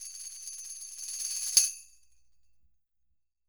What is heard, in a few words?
Roland MV8800 percussion